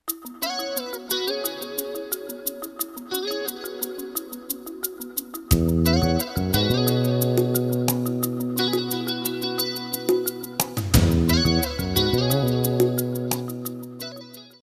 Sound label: musicbed sample, newage